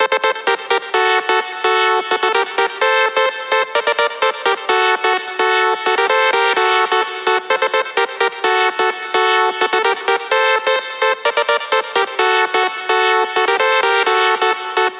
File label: Lead; Serum